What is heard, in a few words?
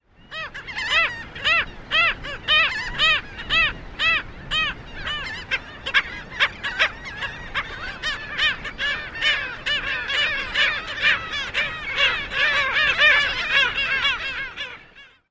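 Black Skimmer more colony calls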